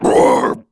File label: gladiator DMG